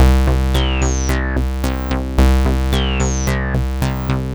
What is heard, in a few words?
MODU C, Modular Synth Loops